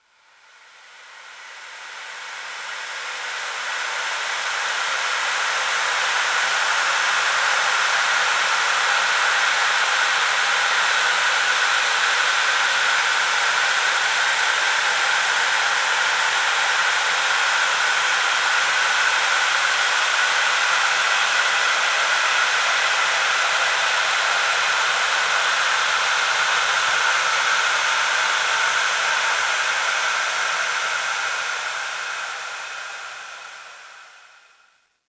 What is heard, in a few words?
Trickle River; Chalice Well